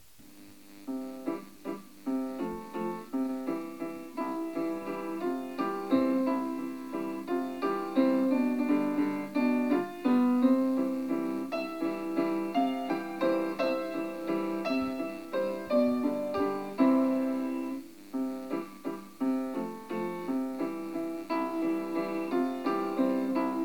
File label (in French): Valse viennoise